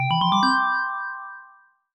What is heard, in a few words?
Loaded Sound; Open Sesame